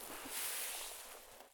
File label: prone grass low; Escape from Tarkov